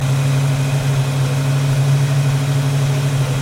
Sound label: Mustang 68 Fastback Loop RPM 2000 Engine Perspective; Revving car engine sounds; lynne publishing